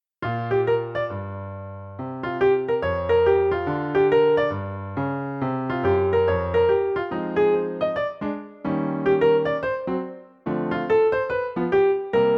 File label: bgm; casino